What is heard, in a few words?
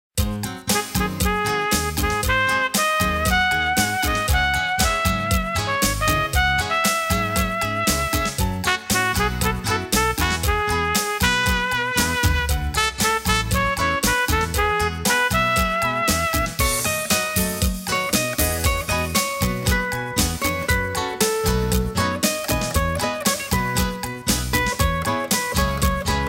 instrumental